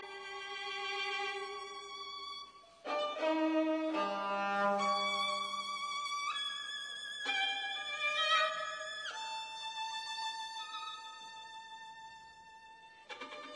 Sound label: String Quartet